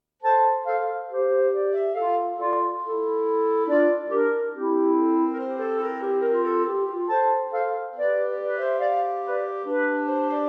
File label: bourree